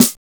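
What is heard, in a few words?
ROLL snare, drums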